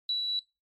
Air Conditioner Beep

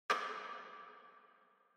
Clock Minute Hand Reverb

